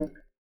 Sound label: type fail; Steam; tenfoot; txting